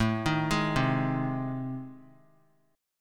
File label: A Minor Major 7th Flat 5th, Guitar; AmM7b5 arpeggiated; JGuitar